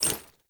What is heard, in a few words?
Generic Footstep; Warframe; Uriel